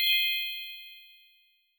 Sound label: confirm, tones; inteface sfx pack 1